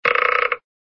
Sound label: ratchet; cartoon; hannah barbera cartoon sound fx